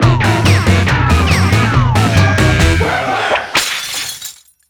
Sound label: Logo Bumper; Quiplash XL; Jackbox Games; The Jackbox Party Pack 2